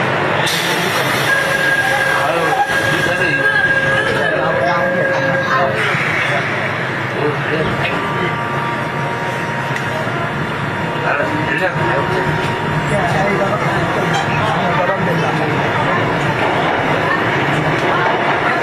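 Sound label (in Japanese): 走行音, 2号線, ソウル地下鉄; Seoul; 新堂(Sindang)-東大門運動場(Dongdaemun Stadium)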